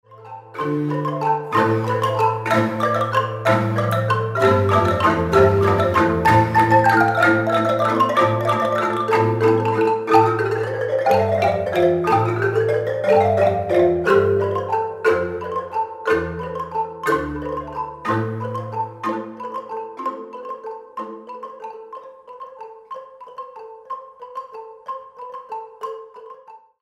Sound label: ukrainian bell carol; web